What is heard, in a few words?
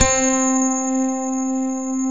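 grand piano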